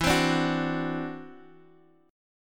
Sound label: E 7th Suspended 2nd Sharp 5th E, guitar